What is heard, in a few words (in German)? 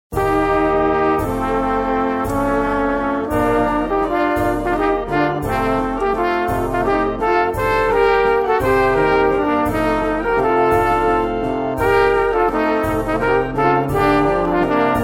Walzer